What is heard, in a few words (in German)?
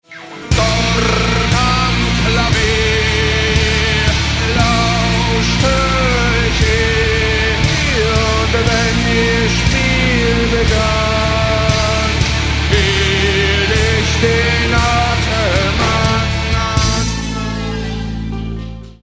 klavier live